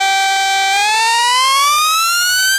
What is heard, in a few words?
HIT ONE UP, Anime Sound FX; COMIC